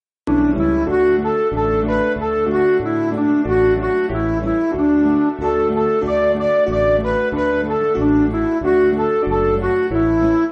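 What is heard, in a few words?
Music Backing Files for Churches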